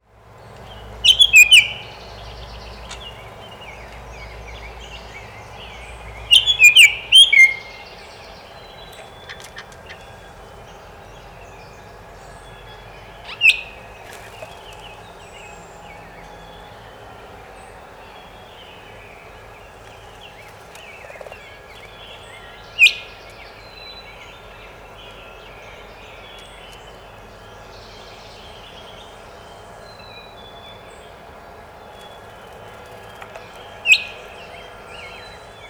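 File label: Baltimore Oriole 2 Long Songs 3 short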